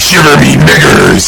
shiver; chatsounds; plugins

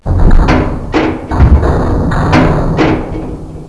elev move

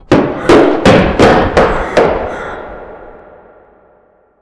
scream

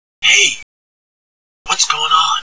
young grunt

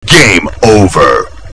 game over

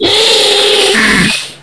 beak hiss

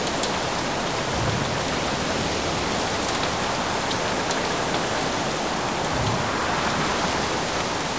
rain

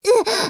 gonome pain

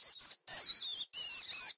rat die

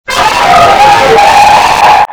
zombie attack

